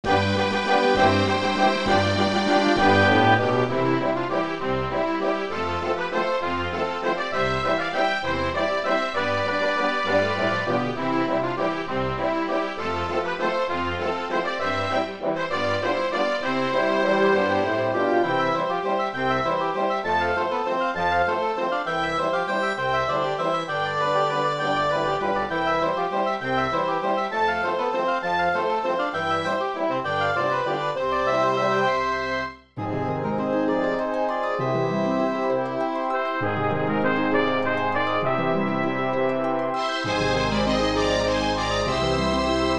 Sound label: waltz